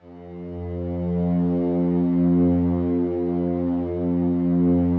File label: slow strings F1, Yamaha VP-1; Music Store; 90's Sample CDs